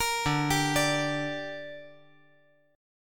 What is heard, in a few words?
D Suspended 4th Sharp 5th D down, chord